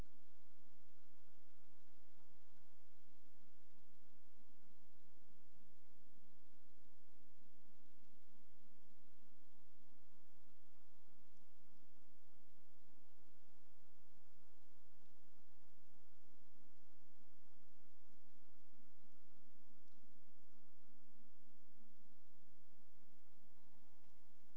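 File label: hydrophone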